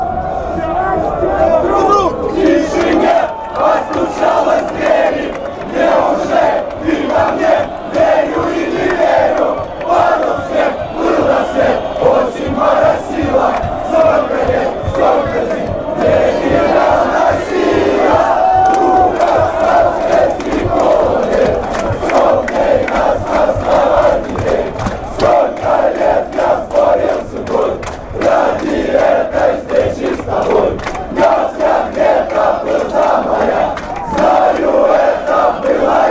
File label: cska chant, fan chants